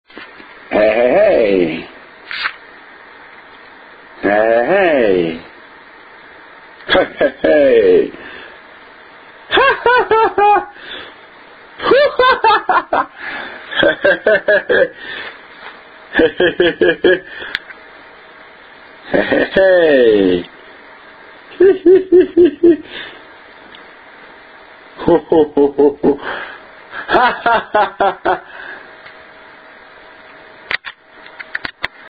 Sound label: heheheh